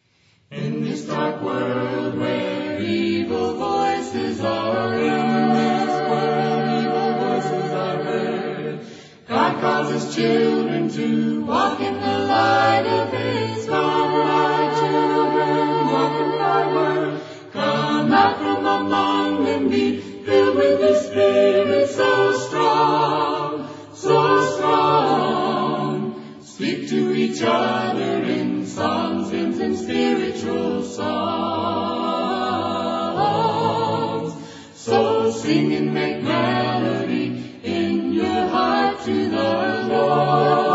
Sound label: a capella hymn